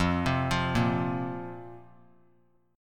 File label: F Suspended 4th F; Fsus4 arpeggiated; 17,19,20,20; JGuitar